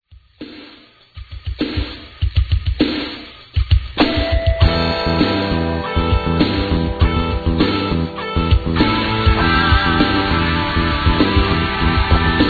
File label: drum solo